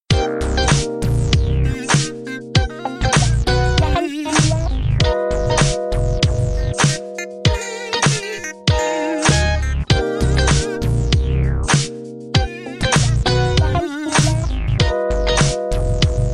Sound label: Altered Groove